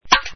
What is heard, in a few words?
TENNIS